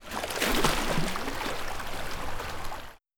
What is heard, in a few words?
in and out of water; ambient; Cubed